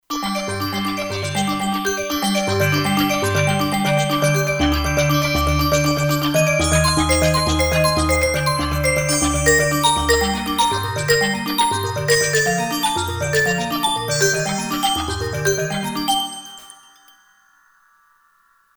Whirligig, Kurzweil K2500X

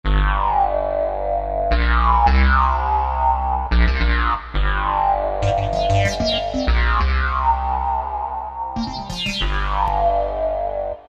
Korg T3 Res-Synth

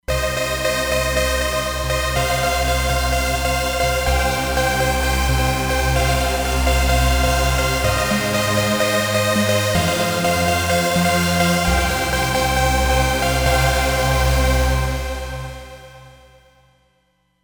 Trancy Synth, Roland Fantom XR

